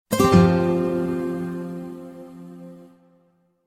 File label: Strum Down